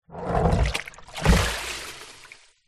foley plastic tub large shallow water inside tip out; zapsplat